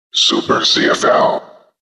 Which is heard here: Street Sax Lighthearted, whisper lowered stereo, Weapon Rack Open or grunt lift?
whisper lowered stereo